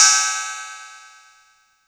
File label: Cymbal; TRX